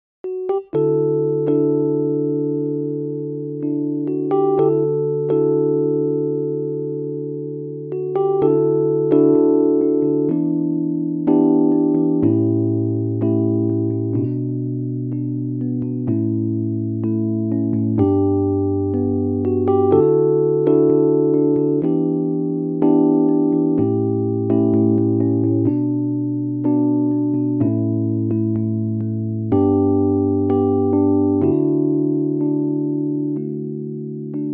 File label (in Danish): vi skal ses igen d dur minusvokal